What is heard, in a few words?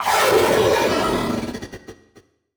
Transition Growls Vocal; Adobe; Production Element